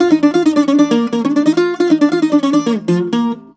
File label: BOUZ A#